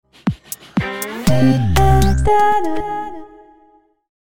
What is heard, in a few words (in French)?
signature courte; SNCF